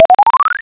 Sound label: BLEEBLE; HIGHTECH